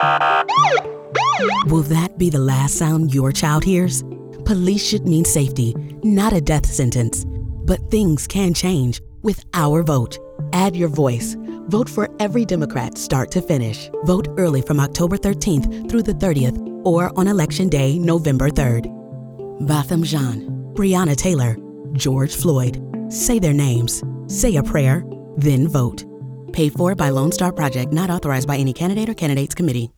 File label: Siren Radio; botham jean